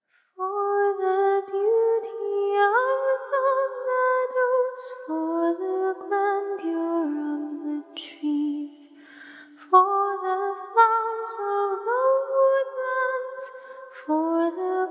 acapella hymns

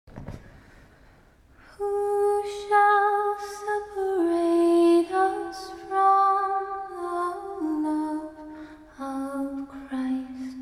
acapella scripture

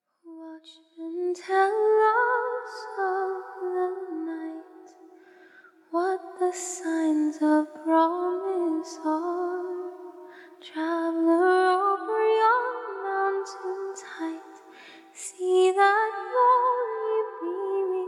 acapella hymns

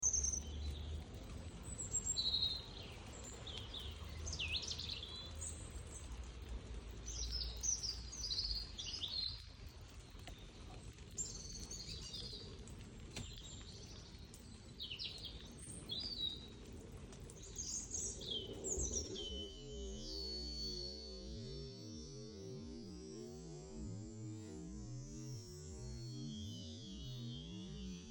water sounds and birds sounds in slow motion

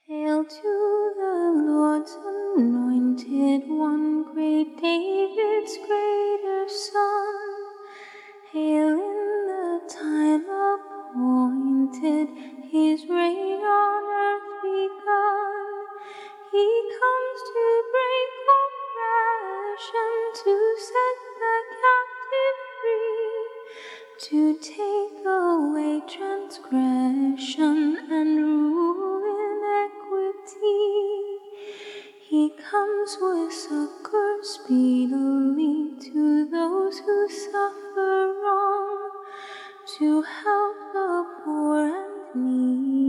hymns of psalm acapella hymns

